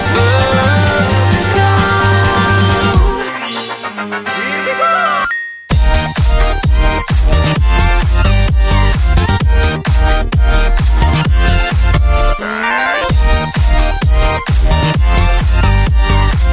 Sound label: dubstep; mario